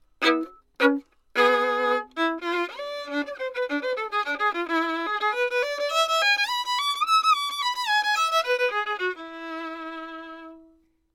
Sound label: violin, tube; lewitt